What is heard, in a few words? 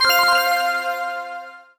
COLLECT ULTRA; impact